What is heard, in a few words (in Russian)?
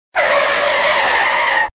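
резкий короткий визг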